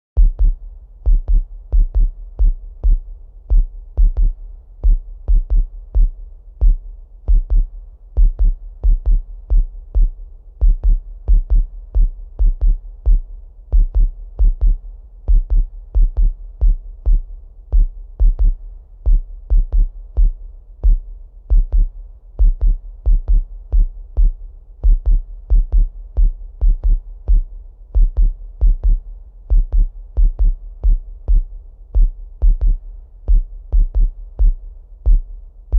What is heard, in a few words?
BD 135bpm